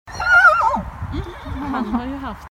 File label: horse-human